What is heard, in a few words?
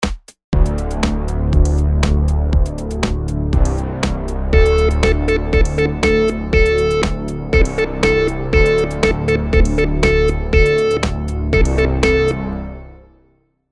rhythm simple, monotone